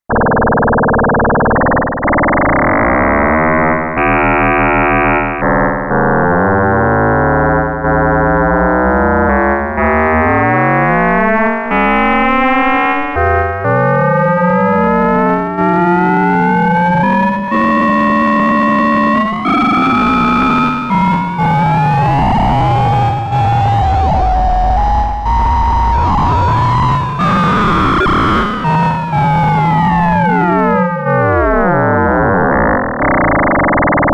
Klavis Module Ring Mod Carrier F Modulated By Osc2